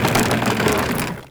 houses mast swing; Tony Hawk's Project 8; XBOX

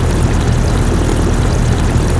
fast, engine; zafir

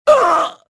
choke

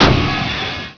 metal; World Racing 2

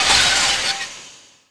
valve off; Tony Hawk's Project 8; XBOX

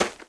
footstep run dirt

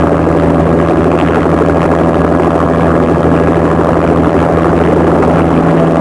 Helicopter flying; Max Payne; manor

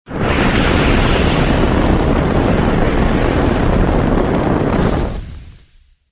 combust fire

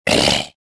land

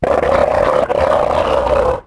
Cerato Roar, Dino

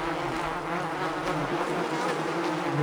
fly; Soldier Of Fortune II Double Helix; XBOX; ambience

